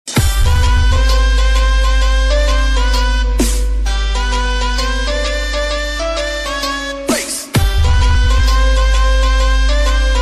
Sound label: eastern style ringtone